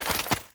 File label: snowball equip; player; winter